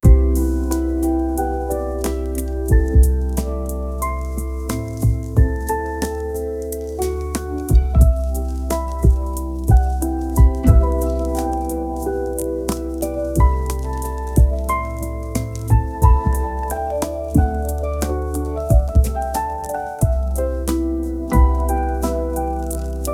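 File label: lo-fi chill hip hop instrumental; soft piano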